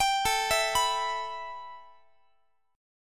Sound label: A 7th Suspended 2nd, A, down, Guitar; A7sus2 arpeggiated